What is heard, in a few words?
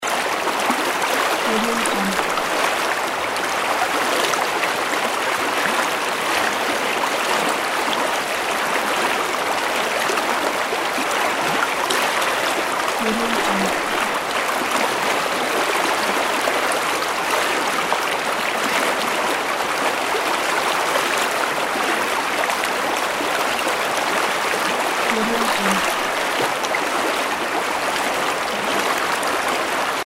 mountain river stream; vintervarg